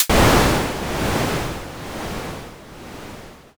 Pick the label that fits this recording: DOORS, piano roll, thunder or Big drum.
thunder